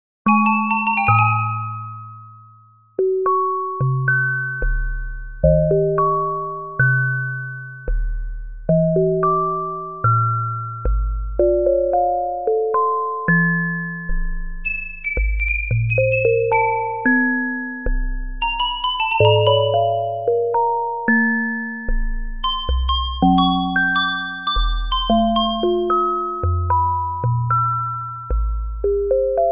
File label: henon, csound; Csound demos